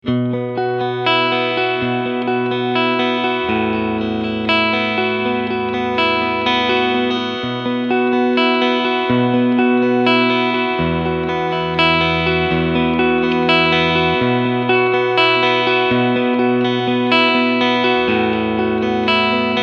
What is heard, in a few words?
Clean Picking